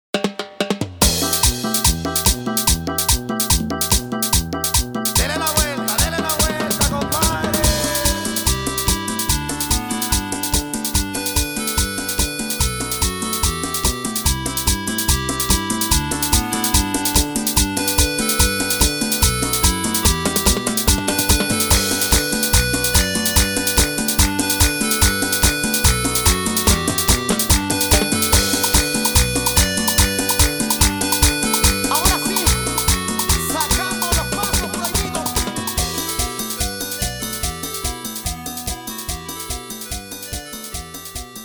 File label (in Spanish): INSTRUMENTAL